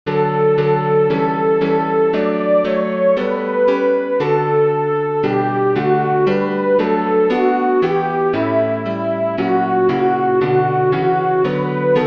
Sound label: lauda anima soprano; Praise My Soul the King of Heaven